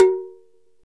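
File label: percussion metal log; Roland LCDP11 Africa VOL-1; Wood & Metal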